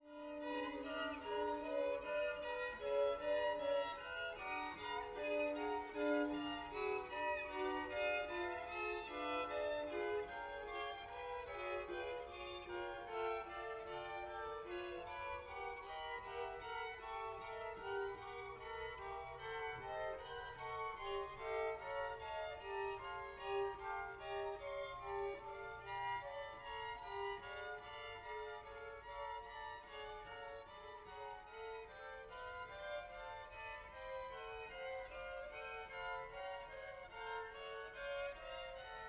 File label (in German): Streichquartett; Cerha; 2. Streichquartett